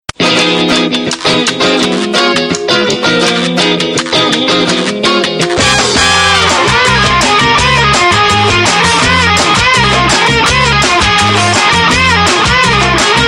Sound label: gtr ring loud